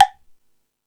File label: Jazz Drums, E-MU Pro-Cussion; drum machine